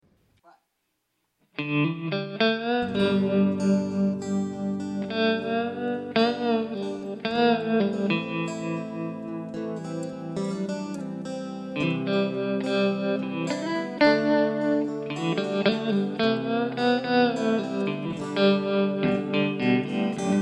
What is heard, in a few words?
FV-1 Tremolo